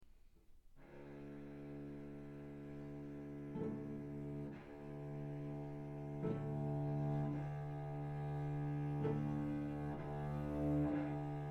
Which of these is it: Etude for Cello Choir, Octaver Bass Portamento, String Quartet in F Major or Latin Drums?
Etude for Cello Choir